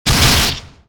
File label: claw